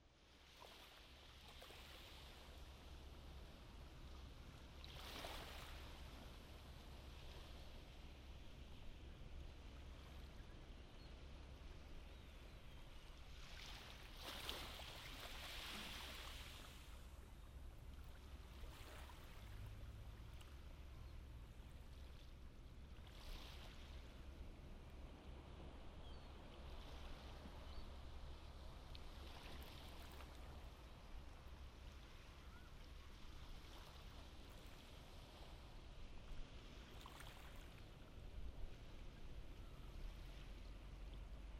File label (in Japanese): nagasaki kaigan